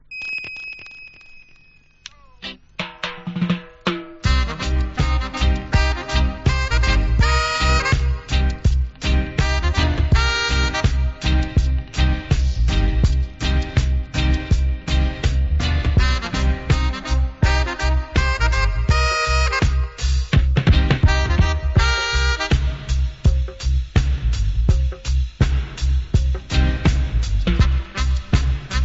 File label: Roots Dub